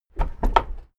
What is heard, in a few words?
car lift down short heavy